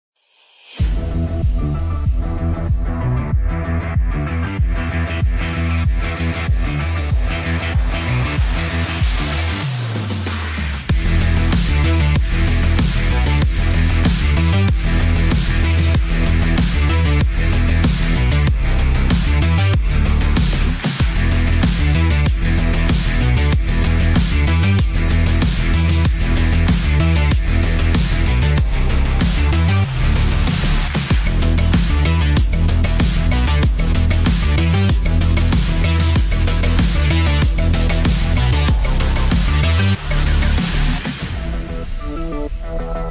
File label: Game BGM; OvertakingLegend